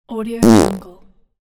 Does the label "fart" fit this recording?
Yes